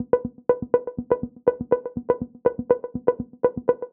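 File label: 122 BPM Sequence C major A minor Tonic C; innovative sequences 1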